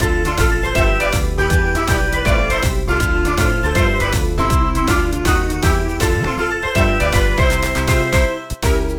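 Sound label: background music skill; cotton candy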